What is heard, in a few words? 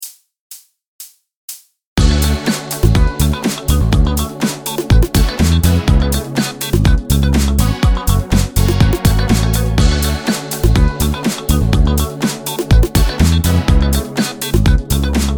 BEAT FOR RAP